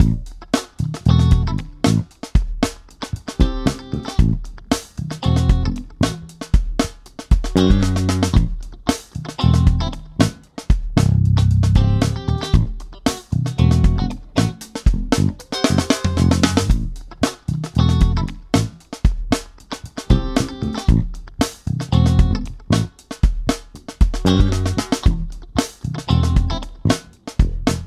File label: solo loop